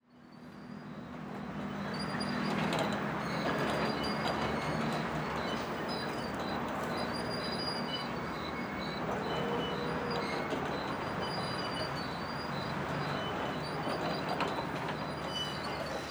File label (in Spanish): AMB EXT DIA PARQUE RODO UY juegos infantiles mecanicos